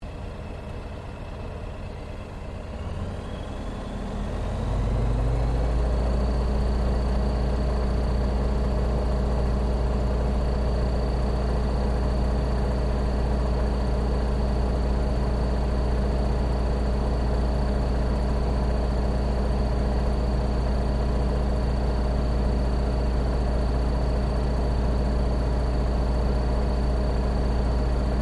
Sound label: 1979 YAKOVLEV YAK-52 SHUTDOWN INTERIOR, Russian Yak 52 Training aircraft; Airplanes; Vehicles